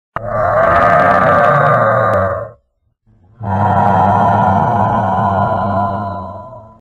Yeti, هیولا; یتی

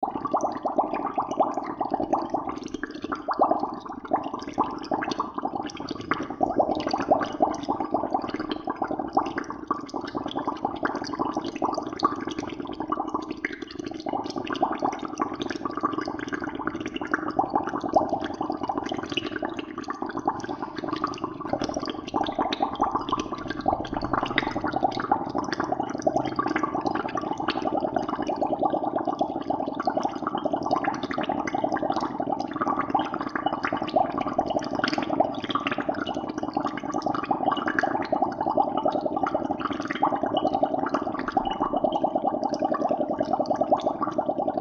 bubbling water sound effect

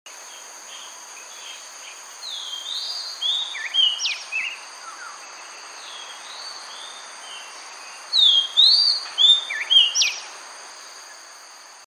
Oriental magpie robin

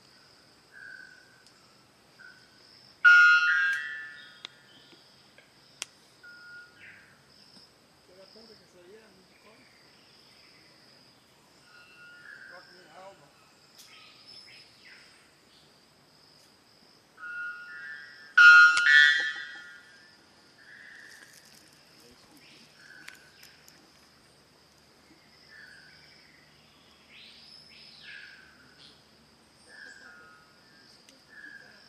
white bellbird; پرنده بلبرد شکم سفید

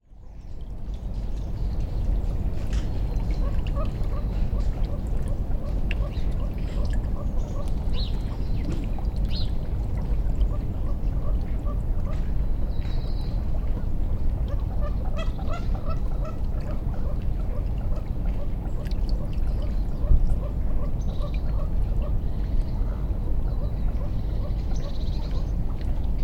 Mandarin duck; اردک ماندارین

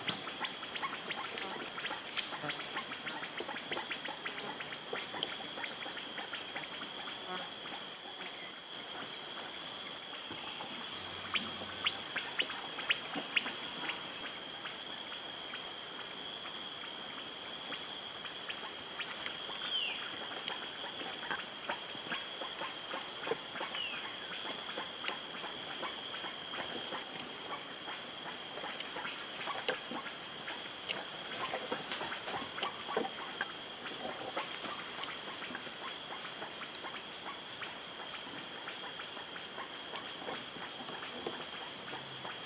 Black curassow; پرنده کاکل فری سیاه

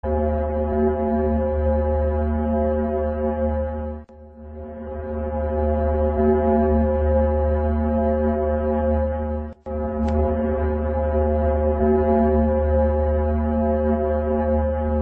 Symphony of planets

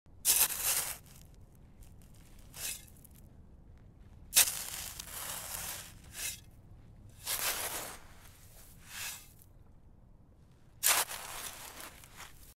garden trowel

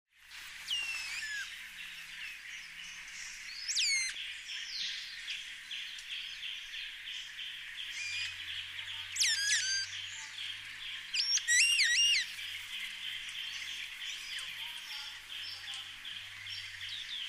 Black saw wing; پرنده اره بال سیاه

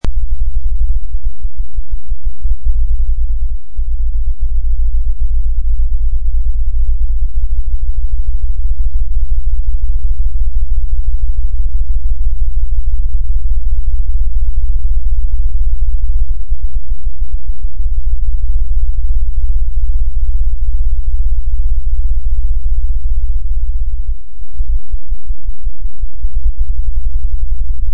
shocked

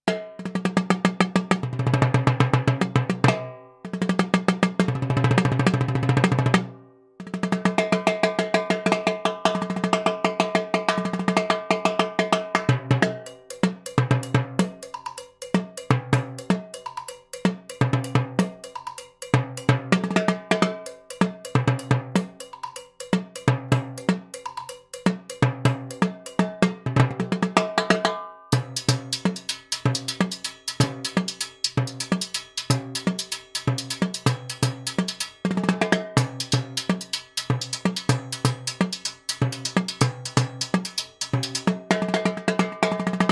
timbale

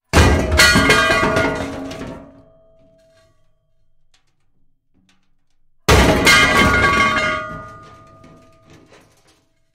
Metal debris

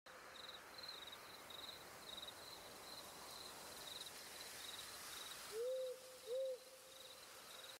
Birds at night; پرندگان در شب

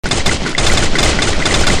FAMAS; اسلحه

